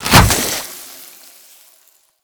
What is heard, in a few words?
Juggernaut Venom Cloud Launch B; Warframe; Infested; Attacks